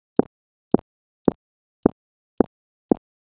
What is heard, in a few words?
footstep sound effect, walk